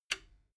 metal button stereo click power on

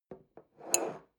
coffee cup place soft

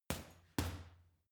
boxing punching bag uppercut double soft

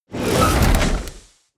sci-fi click metal ready

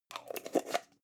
jar plastic midsize close slow

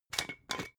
glass bottle massive open fast

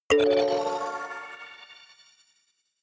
notification kalimba magical fifth

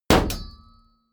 sword hit shield ring

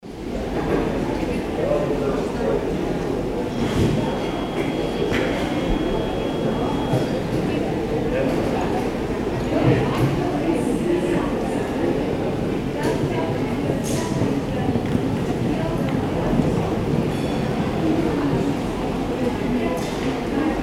airport hall

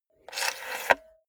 cassette player antenna retract fast

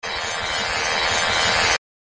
generator

